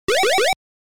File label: Touch Snow-Bee; Pengo; arcade